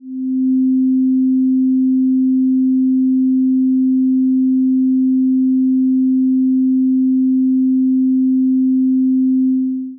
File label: sine